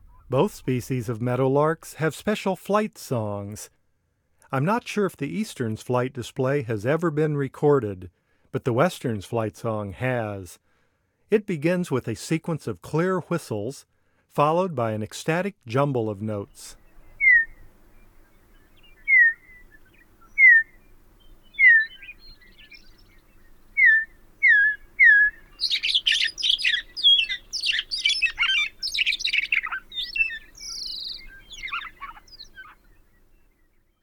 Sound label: Western Meadowlark flight call; North America; Common Western Species; Know Your Bird Sounds